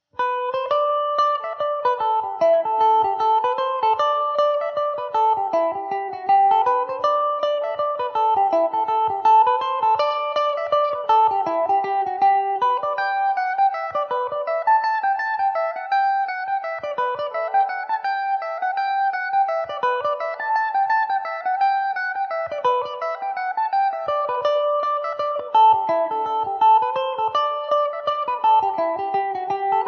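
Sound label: G major, Irish mandolin; Ballykett Courthouse; Epiphone Mandobird VIII electric mandolin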